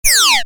Minimise, Effect To Accompany Minimizing Of Window Or Program; Transitions; Multimedia